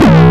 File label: Sync C2; Digital Sound Factory Xtreme Lead